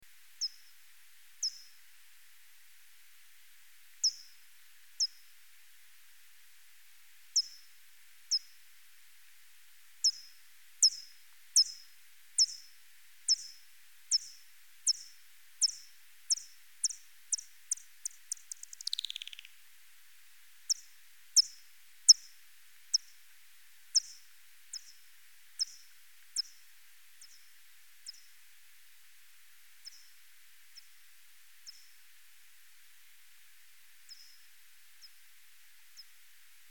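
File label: Chalinolobus morio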